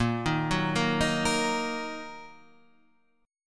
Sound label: A sharp Suspended 4th Sharp 5th; A#sus4#5 Chord {6 6 4 3 4 6} arpeggiated